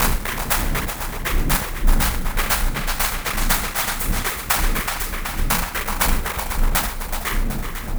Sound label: Glitched, Processed Percussion